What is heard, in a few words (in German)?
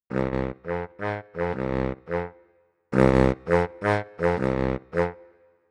Yamaha YDS-150 Bariton